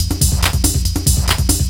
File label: 141 BPM, Drum Loops